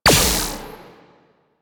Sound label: Laser Skin Printer Laser Start; Props; Warframe; Entrati